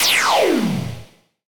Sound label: magical attack